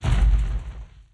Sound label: death; FlyForInsanity